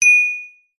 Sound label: multimedia alert notification basic bell synth positive ping; zapsplat